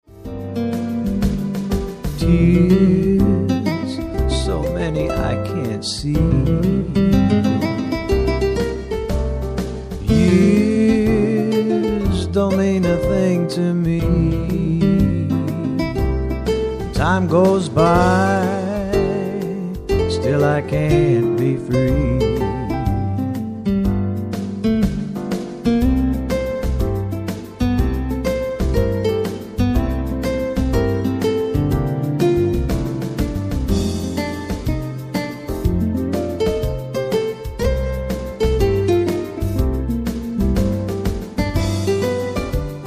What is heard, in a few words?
blues